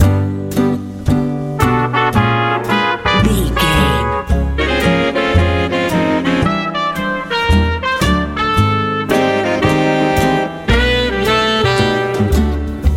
big band jazz

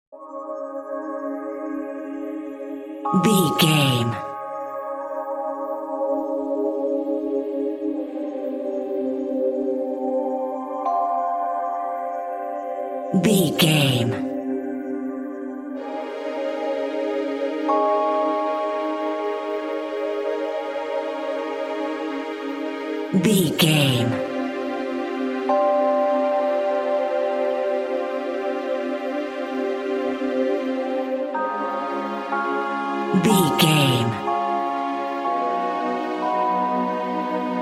enchanted magical forest drone

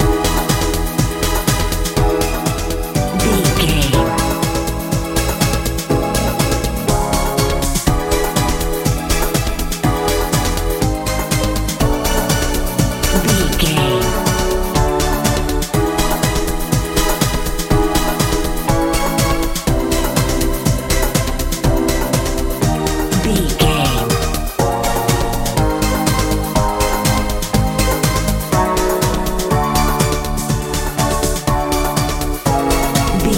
modern dance

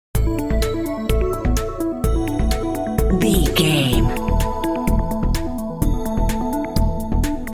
future technology loop

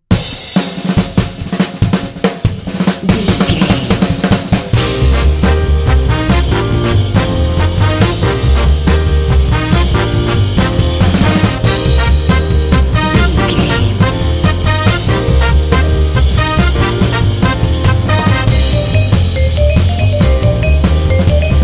uptown swinger

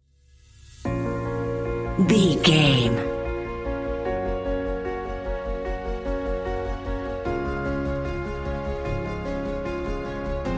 inspiring uplifting epic

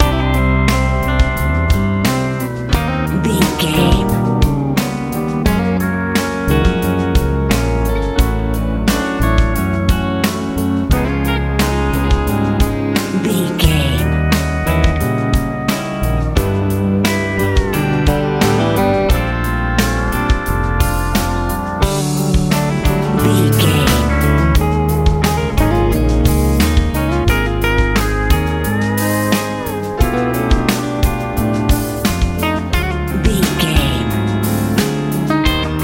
country ballad

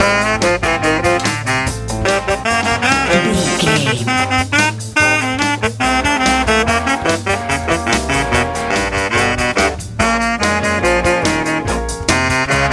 hot colorful easy listening

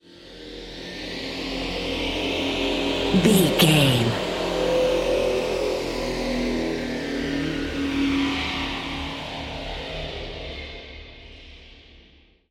ominous war plane heard small cavern

